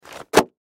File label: Newspaper Bundle, Newspaper Bundle Dropped; Materials; Foley; Blastwave